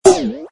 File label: spring boing; Danger Mouse - Ultimate